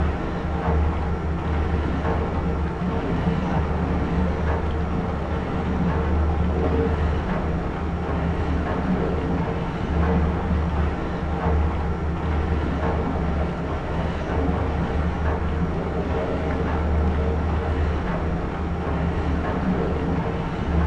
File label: pump close; Star Wars Knights Of The Old Republic I; XBOX